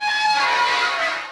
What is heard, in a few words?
fence move, gate; movers; doors; Soldier Of Fortune II Double Helix; XBOX